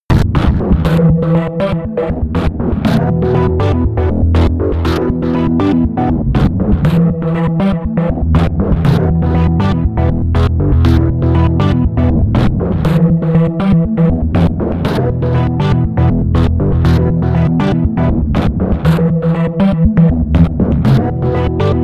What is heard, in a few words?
sequence dirty organ